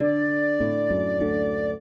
trio, flute harp; Mozart

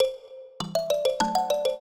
trio, mbira; Mozart

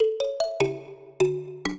minuet, mbira; Mozart

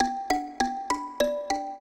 trio, mbira; Mozart

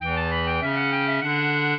clarinet; Mozart

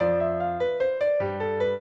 piano; Mozart

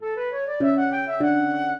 minuet, flute harp; Mozart

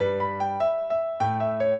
piano; Mozart

